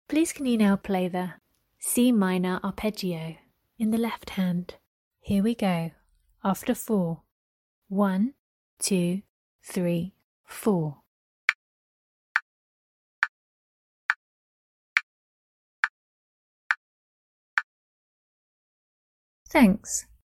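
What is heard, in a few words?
C minor arpeggio left hand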